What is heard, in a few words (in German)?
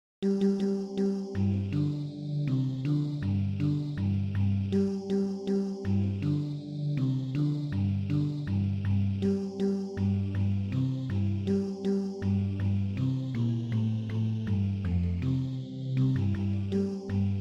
Die Gedanken sind frei bass